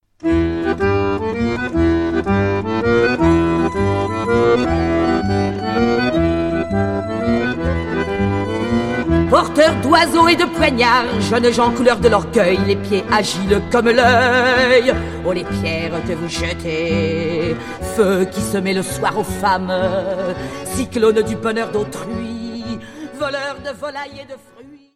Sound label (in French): Chant; Chant des vauriens